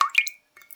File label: Drips